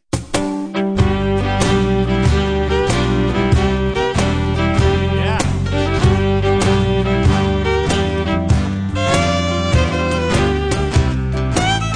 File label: Cello